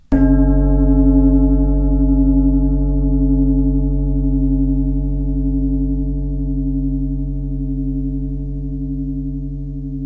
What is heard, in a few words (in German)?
fussklangschale chakra